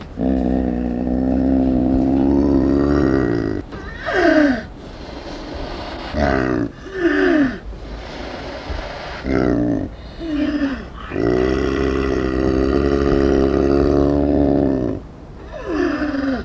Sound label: Uncia uncia growling; Snow leopard; The growls and deep breathes; the snow leopard is threatening